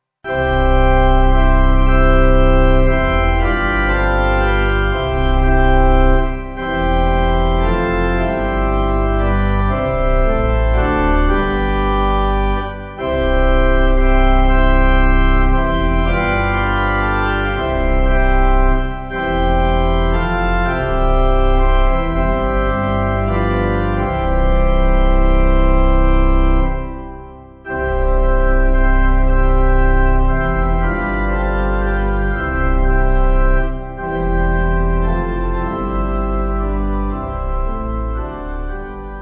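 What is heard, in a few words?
Pipe, Pipe Organ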